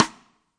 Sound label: real snare; REAL LIVE KITS